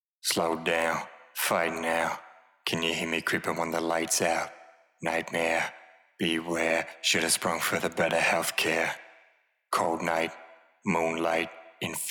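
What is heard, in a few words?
hip hop, 102, E minor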